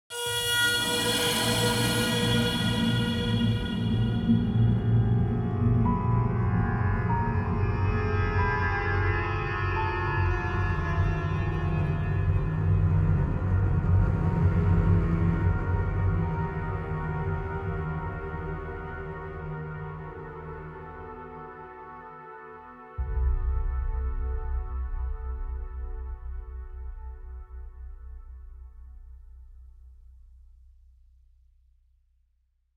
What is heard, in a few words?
Opening, Classic Horror Sci-Fi Music; Opening 6 (34sec); Dead Ark